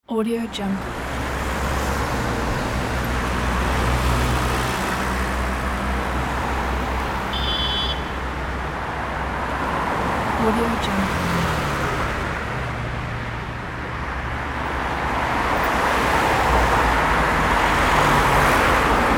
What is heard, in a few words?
cars on freeway